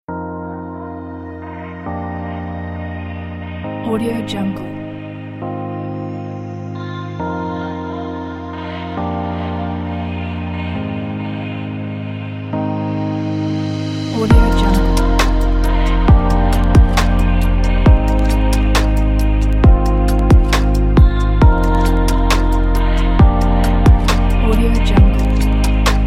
atmospheric modern electronic pack

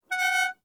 ferrari308 car honking dual air horns; prosoundfx

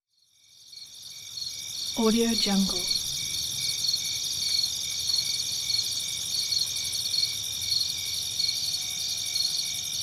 jungle insects

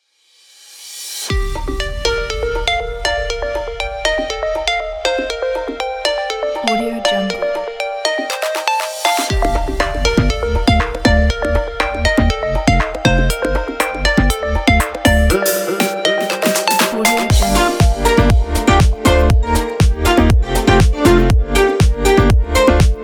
the commercial background deep house

